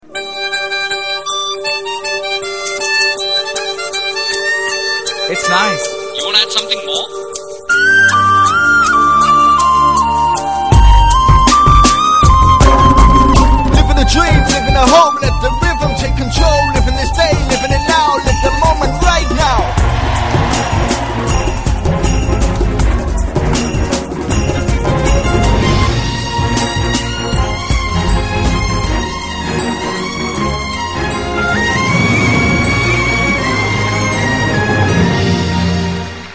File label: New Hiphop Ringtone